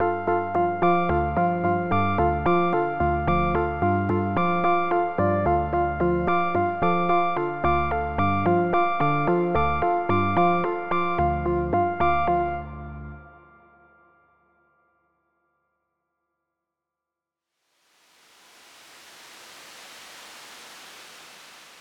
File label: random notes; sandpile; bullseye